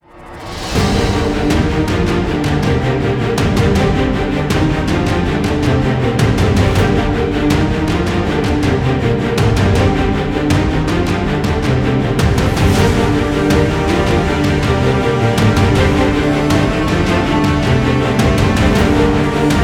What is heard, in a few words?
tension violin; gerilim; By Burak Sound Bank; tension gerilim vol 10